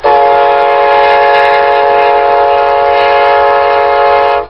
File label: horn